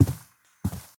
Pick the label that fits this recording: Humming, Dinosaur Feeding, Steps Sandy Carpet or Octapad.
Steps Sandy Carpet